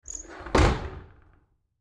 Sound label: Household Closet Double Wood Doors Close Together Hard; Blastwave